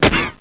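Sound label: ricochet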